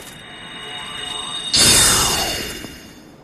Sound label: magic spell; dark souls soul arrow